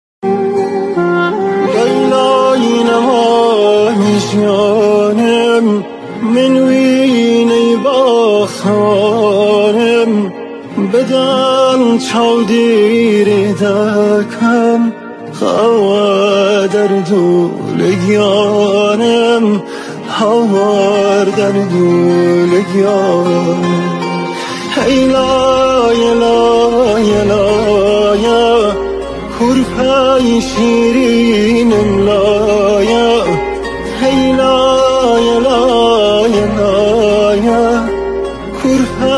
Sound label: Kurdish lullaby; لالایی کردی